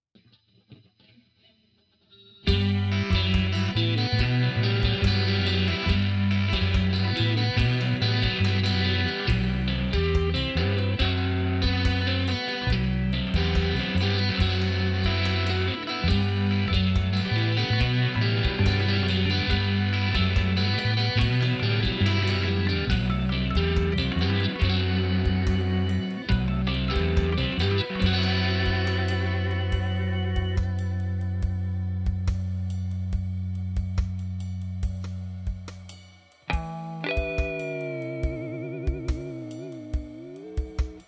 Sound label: Theremin